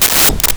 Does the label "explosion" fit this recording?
Yes